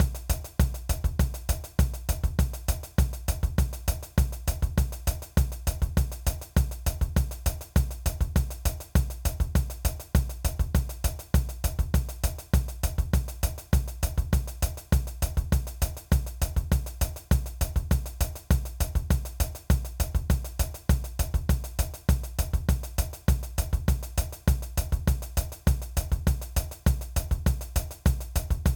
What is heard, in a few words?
100bpm Brushes